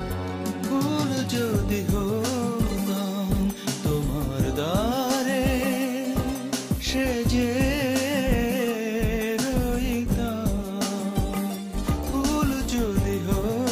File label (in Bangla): Bangla Song